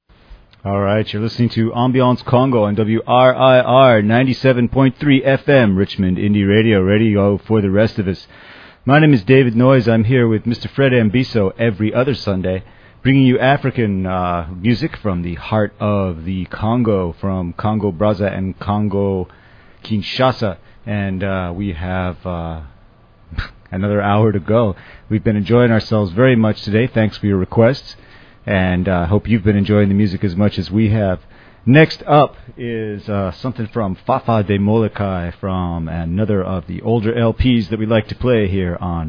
Ambiance Congo

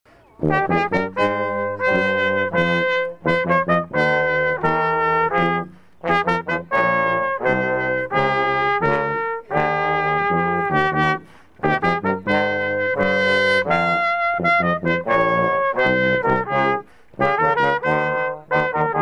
Brass Band

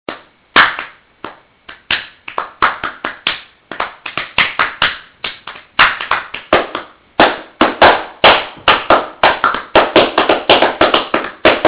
CLAPPING

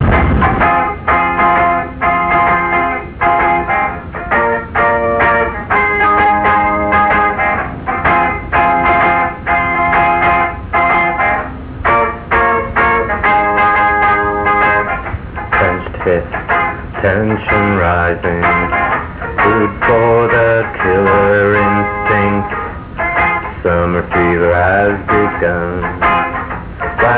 electricguitar

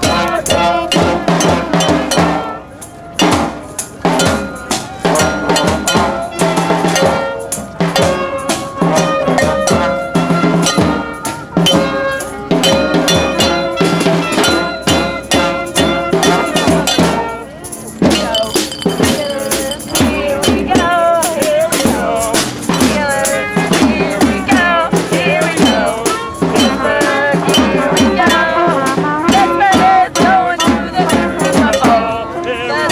bandmusic

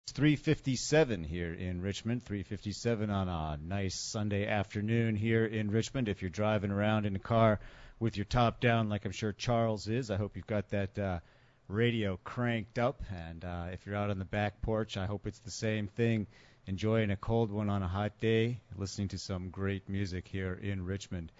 Ambiance Congo

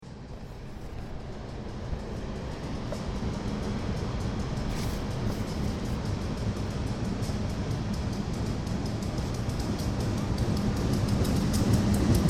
RAILROAD soundscape mix

